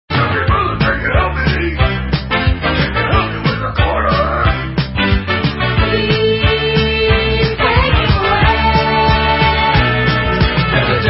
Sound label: lofi back, derelict